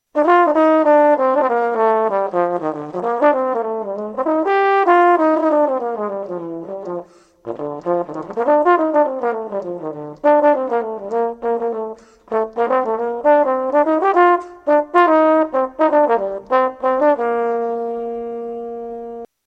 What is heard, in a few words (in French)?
trombone a pistons